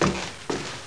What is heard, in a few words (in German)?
holz